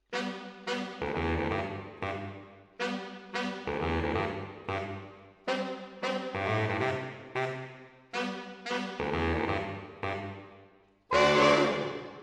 MKH AB, piano

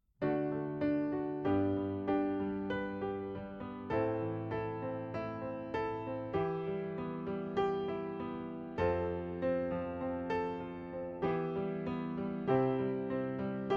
Piano A

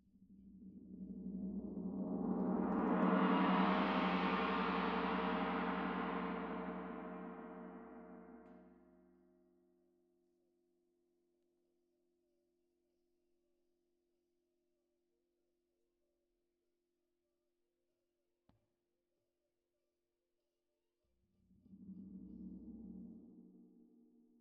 Tam-Tam Close

